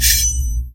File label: Metal Sound Fighting Game